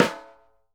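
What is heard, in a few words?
snare GT rim, Synclavier Percussion; World & Orchestral; ILIO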